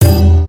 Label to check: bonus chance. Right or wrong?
Right